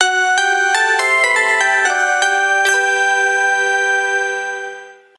Message Alarm; SK telesys SK-S170

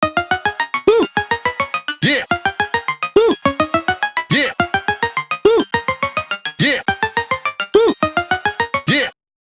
woo yeh, ringtones; Nothing Phone (2)

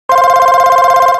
Ring Standard, phonetones Motorola; Q9h

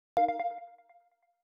Dudu, notifications; Motorola Moto-G20; lenovo

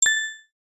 doremi; SKY IM-A800S VEGA LTE; keytone

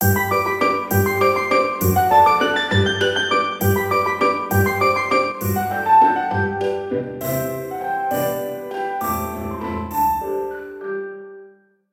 Waltz; Itel Vision 1

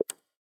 swipe unlock; LG X power 3

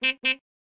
Car Horn

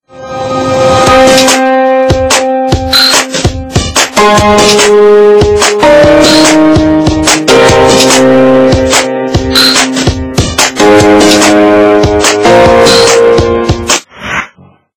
TestRing, Samsung phonetones; GT-C6620

